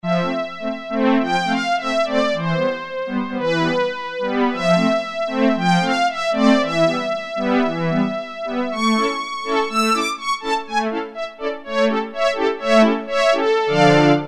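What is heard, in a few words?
BowdStrngs, Roland MKS-50 bowed strings; Factory examples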